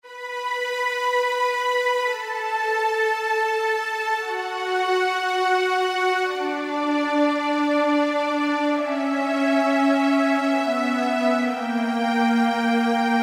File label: Slow Str, Yamaha YPT-400; Voices